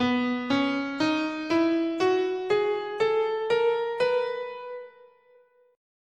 B Bebop Dominant

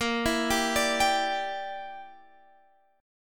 Bb 6th Flat 5th, Guitar, Half Step Down

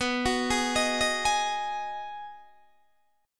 B 6th B down Guitar Open D

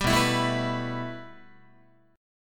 B 7th Suspended 4th Sharp 5th B down Guitar; B7sus4#5; strummed

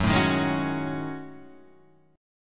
F sharp Minor 6th Add 9th, guitar; strummed; JGuitar

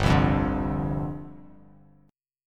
G 7th Suspended 4th G down Bass Standard; G7sus4 strummed

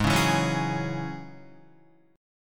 G sharp 7th Suspended 4th Sharp 5th, Guitar; strummed; chord

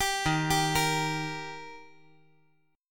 Eb Major Eb down 5G,4C,5E,5A; arpeggiated; JGuitar

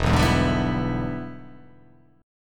E 7th Sharp 9th, chord

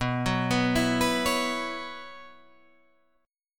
B Suspended 2nd Suspended 4th, Guitar, Open G

